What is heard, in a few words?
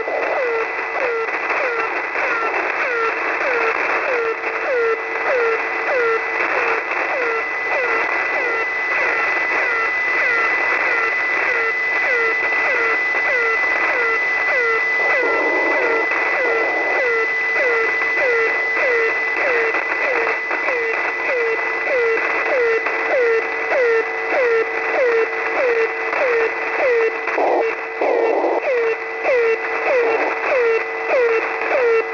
usb